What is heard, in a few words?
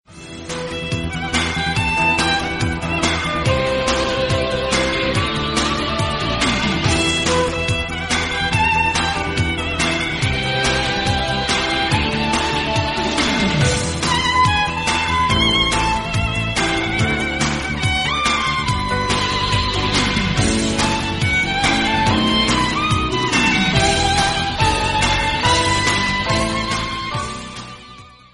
jingle; sailor moon